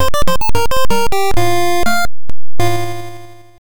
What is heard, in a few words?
Hill Boulder Level Complete; Jungle King; Taito SJ System